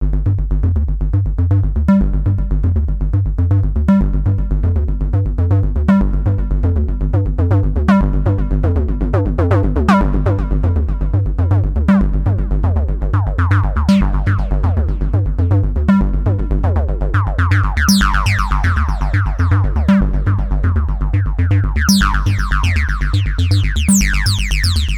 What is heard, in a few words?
yamaha tg77 303 fm-acid external fx; TB: TG77 Acid FM-simulation, Reverb and Delay by Logic